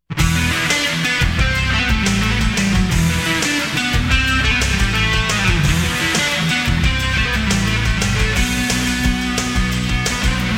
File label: driving rock